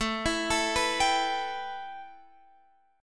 A 7th Suspended 2nd, A, down, Guitar, Half Step Down